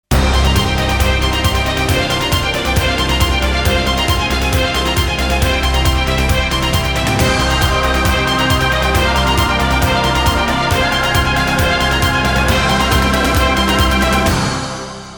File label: brass bang